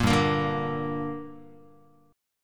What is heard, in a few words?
G Minor G down Guitar, chord; strummed; JGuitar